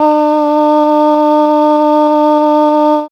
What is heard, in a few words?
female ah; AMG